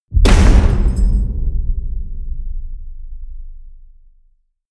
metal crash ring medium

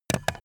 stapler pickup

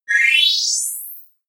appear sparkle long

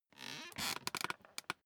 office chair creak sit up short

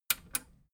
metal button radio click enable slow

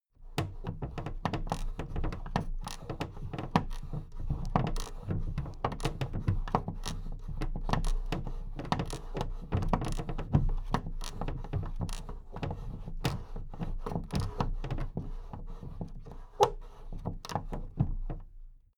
big plastic case movement handle rumble

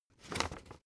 old flip page fast short

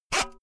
saw use short fast

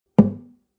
nylon guitar drum single tonal

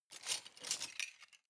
cutlery large multiple drawer grab

